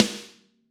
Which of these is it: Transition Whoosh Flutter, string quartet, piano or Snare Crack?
Snare Crack